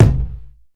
reverb steel kick drum a sharp key, kick one shot tuned to the A# note